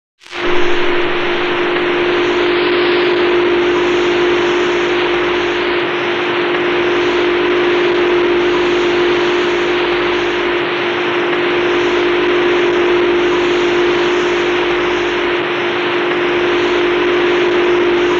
Radio Static Radio

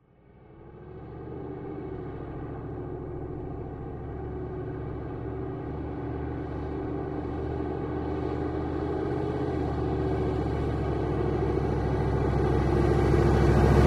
Humvee Med By Accel

